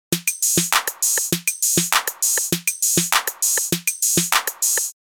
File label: 808 clone